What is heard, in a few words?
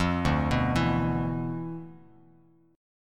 D Minor 6th Add 9th D, Bass Standard; Dm6add9 arpeggiated; JGuitar